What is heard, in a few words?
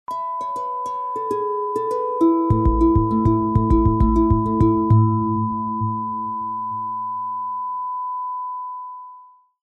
Jingle